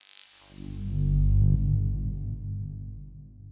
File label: Low Wave